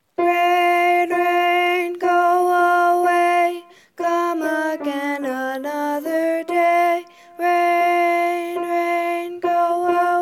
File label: Rain Rain